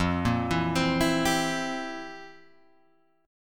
F Major 7th Suspended 2nd Suspended 4th, F, down, Guitar, Open G